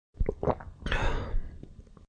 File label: Swallow Breath, Swallowing liquid followed by a quick breath.; Swallow then Breath 1; Drinking; Human